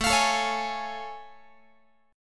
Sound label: A Augmented Major 7th A Guitar; A+M7 strummed; x,17,20,19,17,19